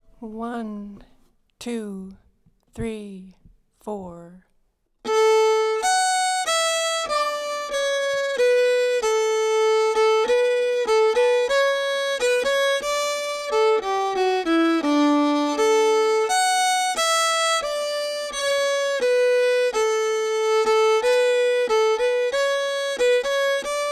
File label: unsetholmen ganglat slow, fiddle